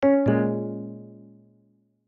Sys File Open Foes; Oxygen; plasma

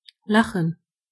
laugh; gcompris

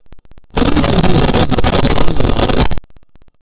roll; ksirk

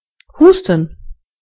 cough; gcompris

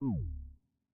Window Minimize; Oxygen; plasma

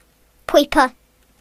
pipe; gcompris-data

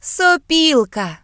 flute; gcompris-data

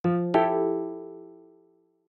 Finish Error; oxygen sounds; plasma

